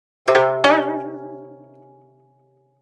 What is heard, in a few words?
Surprise Dialogue Box (Gayageum)